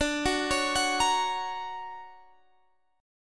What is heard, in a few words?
F sharp Major D